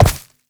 Step Vegetation; Movement; Uriel; Warframe

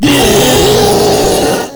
Proto Infested Ancient Vocal Attack; Warframe

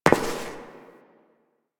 Step Metal; Warframe; Koumei

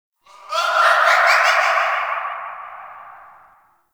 Canvas Laughs; Gameplay; Warframe; Shadowgrapher

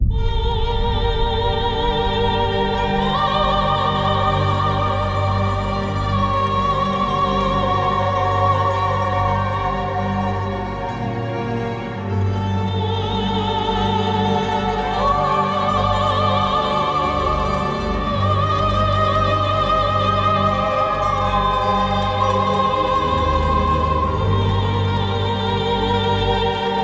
Login Music; Login Screen; Duviri Kullervo

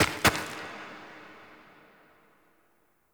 Foley Steps Door Slam; Warframe; The War Within; Mountain Pass; Cinematics; Operator

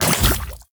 Crafting Equip; Deimos

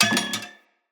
Hunter Bow Drop; Weapons; Enemy; Bows

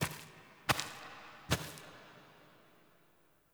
Foley Steps Turn End; Warframe; The War Within; Mountain Pass; Cinematics; Operator

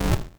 Corrupted Notes; Warframe; Bard Quest; Bard Boss Fight Notes

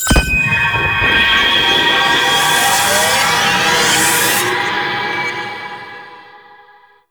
activate tower power stream; Warframe; The New War

